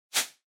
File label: Noise Sweep Dry